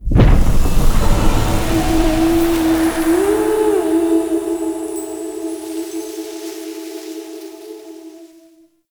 Barrier Disable; Puzzles; Wevetwood

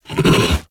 idle alert scratch irritated, Kubrow; Enemies; Warframe; Tame

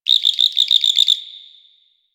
Valley Tree Critter; Ambience; Warframe; Quests; The New War

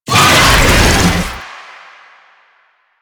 Infested Oni Melee Start; Warframe

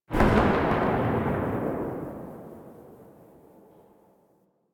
Archgun Charged Tail; Warframe; Archwing; Weapons

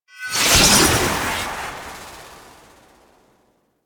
Sword Deactivate; Doom Sword; Warframe; Excalibur

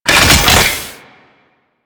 Thumper Rocket Cannon Extend; Warframe; Grineer

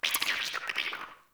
Root Cave Creature Close, Ambience; One Offs; Neathuns Root Cave

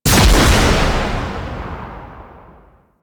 Crewship Missile Launcher Shot; Warframe; Vehicles; RailJack; Crew Ships; Grineer Crew Ship

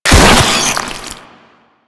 Maggot Pain; Enemies; Vocals; Warframe; Proto Infested

